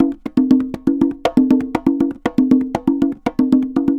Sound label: CONGAS, DRUMS SAMBA; Best Service ProSamples vol.14; AKAI